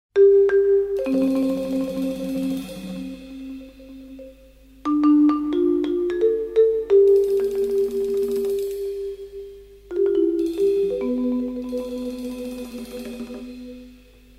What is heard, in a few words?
Quartet, Piano Soul Jazz